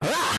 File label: distorted scream short jumpscare layer creepy horror